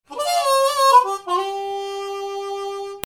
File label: MUSICAL